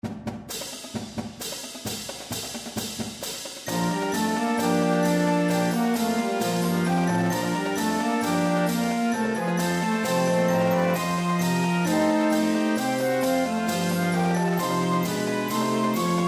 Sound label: Fight Song Medley, Band; AACS Alumni Band